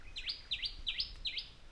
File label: tailorbird model